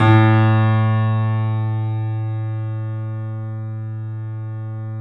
Small Pianos, 3-D Audio Collection

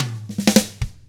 POP ROCK, Double Platinum Drums; 109POP ROCK

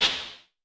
CLAP, Vermona Mono15 Drums

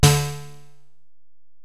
Bell, Alesis DM-PRO

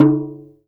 MALAY DRUMS; Spectrasonics - Heart of Asia CD2

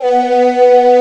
VOCODER; East West; Global Sounds

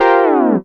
BEND DOWN, Korg, FX; Back In Time Records; Korg Universe VOL-1; FX FAMILY

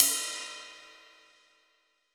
Ride Cym; Roland BK-5

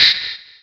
Verb, Hit; Sonic Foundry (Sony Creative Software) - Future Retro Dance Excursions

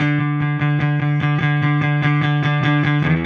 Silos Clean Strum Guitar, Indie Rock

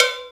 PERC, Dance Mega Drums; Best Service; PERC-MIX

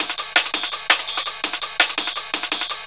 Back Break, Loops; m8 breaks

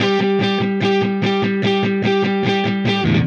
Silos Dirty Strum Guitar, Indie Rock

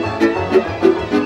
VIOLA GROOVE; Best Service; World Colours

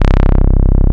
CS01 FUZZ BA; Best Service; Alpine Volksmusik; BRASS+BASS

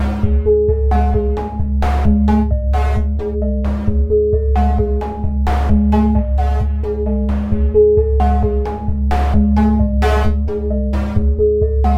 Sine Wave, Processed Percussion

